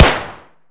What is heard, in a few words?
tt fire indoor close; Escape from Tarkov